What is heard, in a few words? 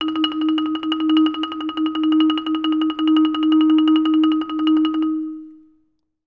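TREMOLO D#5, Orchestra; Best Service; Peter Siedlaczek's Advanced Orchestra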